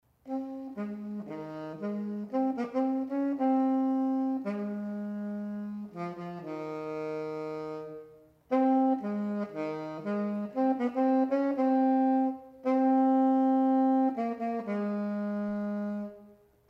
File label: Instrumental; calvaryministries